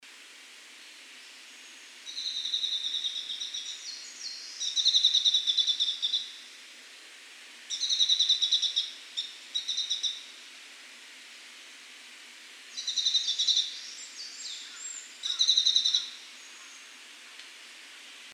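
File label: Eastern Crowned Warbler; sapporo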